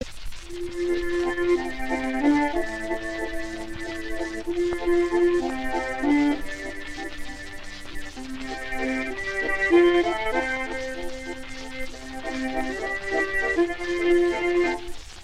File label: Sirens Waltz